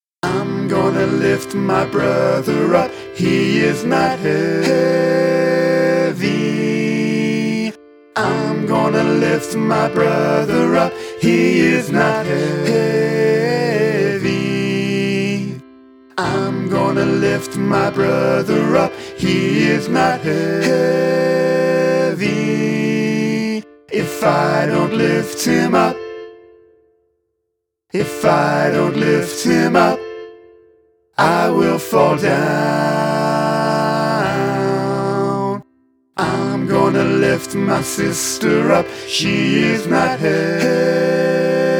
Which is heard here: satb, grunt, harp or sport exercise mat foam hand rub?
satb